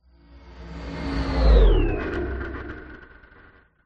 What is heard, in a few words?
science fiction craft pass by robotic mechanical futuristic